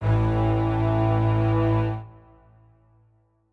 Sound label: strings